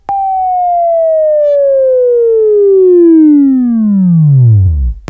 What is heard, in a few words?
Buzz; Labview-Program; Sweeping Training program